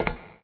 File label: met walk; Marisa Kirisame